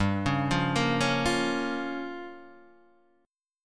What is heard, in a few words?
G Minor 7th Flat 5th, Guitar DADGAD